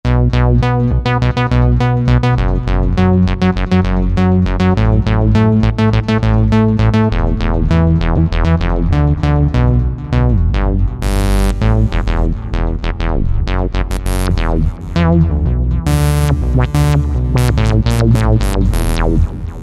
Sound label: Acid Punch; Roland SRX-05 Supreme Dance